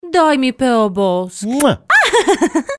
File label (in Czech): jingel